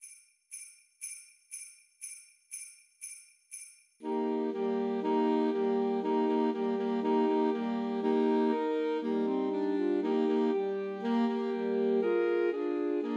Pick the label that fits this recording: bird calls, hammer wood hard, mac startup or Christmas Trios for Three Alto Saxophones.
Christmas Trios for Three Alto Saxophones